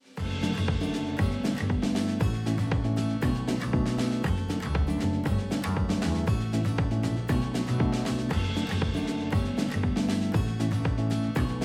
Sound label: kick default